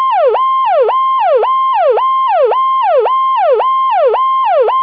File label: warble, siren; dash mount siren